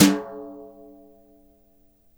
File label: Kit Brush, Drums Acoustic; USB Soundscan vol.10; AKAI; 90s sample CD; ALL BRUSH